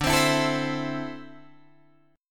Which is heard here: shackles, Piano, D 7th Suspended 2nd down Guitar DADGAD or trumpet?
D 7th Suspended 2nd down Guitar DADGAD